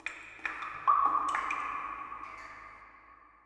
ambient water drips; nature; Portal 2

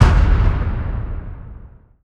final slam; events; Portal 2

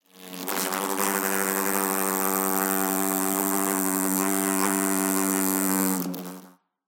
amb flies buzzing; Half Life Alyx

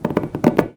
body medium strain; physics

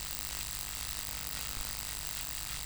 buzz loop, electrics; creatures

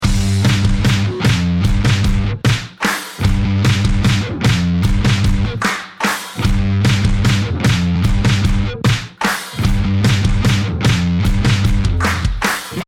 start round, music

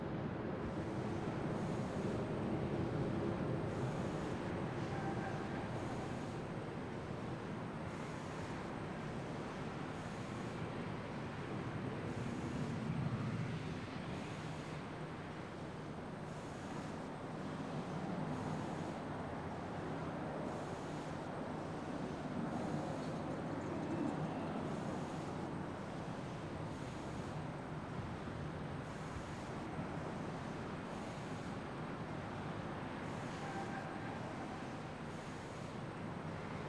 bg nuke; ui

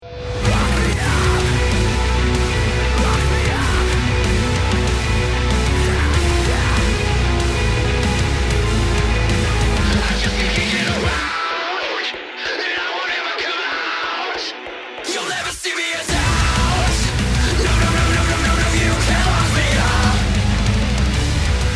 start action, music

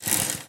window blind close move; scripted sequences

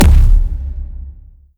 step layer, dog; npc; scripted

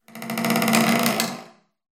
wood, ambient; alyx sanctuary; Half Life Alyx

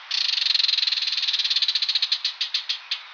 bird, ambient animal; Counter Strike Model Pack; CS:S

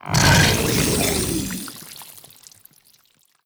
puke, barnacle; Half Life Alyx

